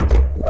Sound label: ministrider footstep; hl2 ep1